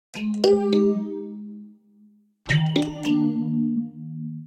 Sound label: Music Box Melody C Minor 104 bpm; MIDI Clips; Tonal; Ableton; Factory Packs; Lost and Found